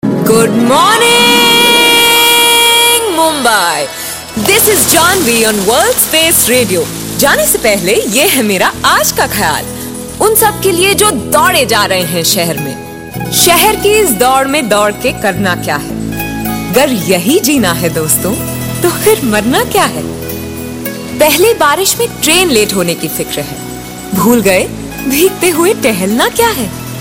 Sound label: alarma good morning ringtones